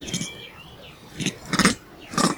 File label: Vervet monkeys Eagle Alarm Call; vocalisations; compcog